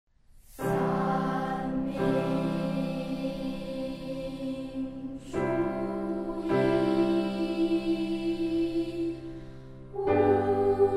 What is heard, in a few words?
Anthem National